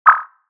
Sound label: increasing Multiplier Update; dead or alive 2